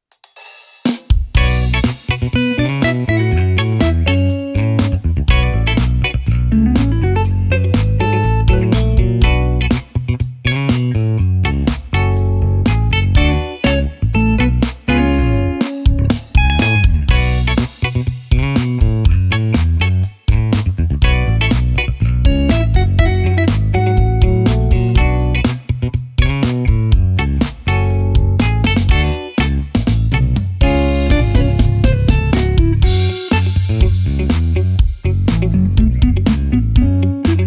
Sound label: short instrumental